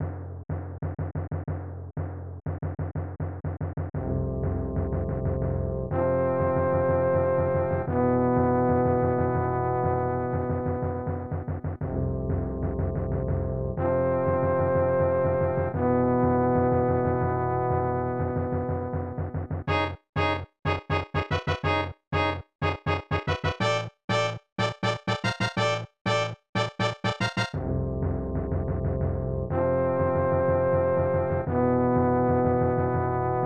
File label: Airship BGM; SMB3; Super Mario All-Stars; SNES